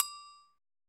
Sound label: cymbal, Roland MC-909